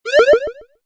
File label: whistle sound